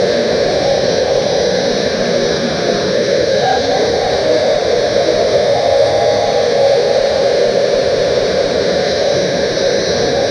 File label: medieval square of the; town